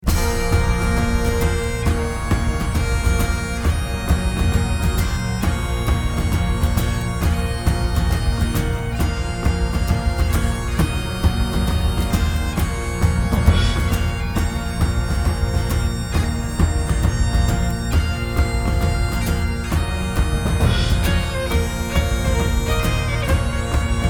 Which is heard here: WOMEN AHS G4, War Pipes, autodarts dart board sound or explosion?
War Pipes